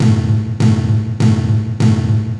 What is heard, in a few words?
Crater Toms, processed; Sonic Foundry (Sony Creative Software)